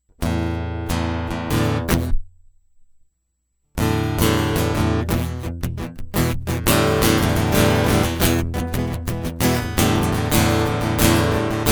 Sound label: Guitar Interlude; hyper terrestrial; Focus on Focus